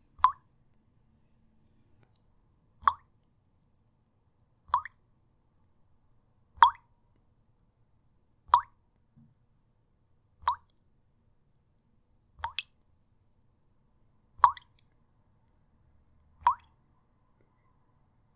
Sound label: drops on bowl, 茶碗に水滴; 茶碗に水滴１; wetarea